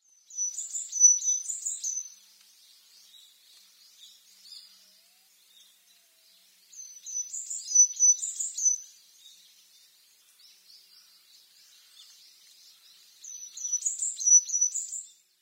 amamiyamagara, amami, koe; yanagawa

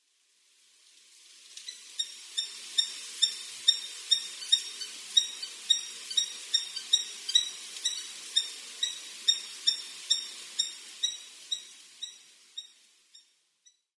chigohaya, tendo, koe

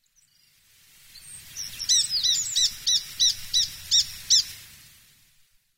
koakagera, obihiro, koe

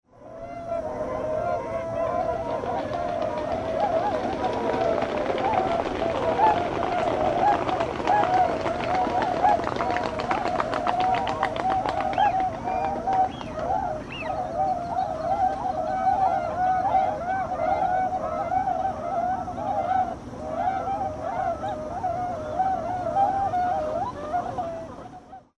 kohakucyou

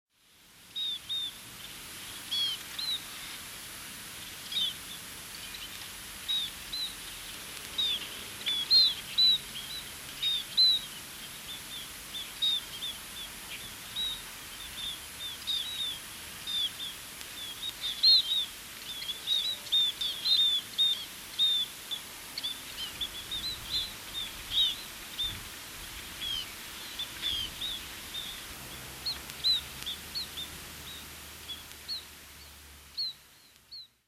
shimakinpara

